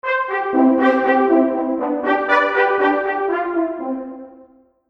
Melody Brass; Musical

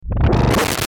Glitch Motor Distortion, Glitch Error Power Down; Production Element Imaging Element Accent Transition; Blastwave

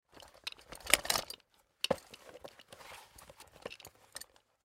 Toolbelt Remove Tool; Hand Tools; INDUSTRY; Blastwave

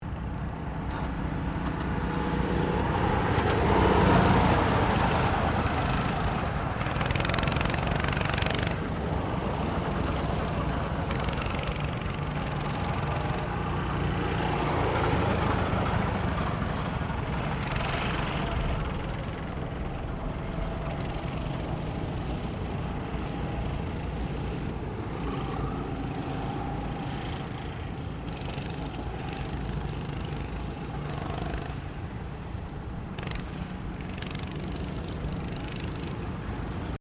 GOCARTS SEVERAL PASSING, Gocart track; Gocart track in Queensland; Vehicles

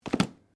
Book Page Heavy Place

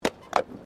Tram Horse Drawn Horse Step Pavement

